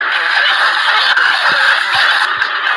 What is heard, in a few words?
coughing; mrs goggins